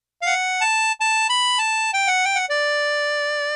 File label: harp